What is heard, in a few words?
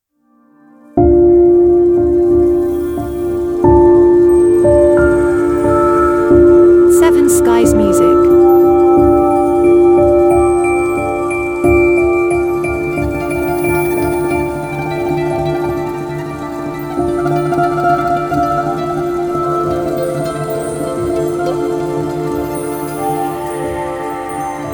Ambient Reflextive Dreams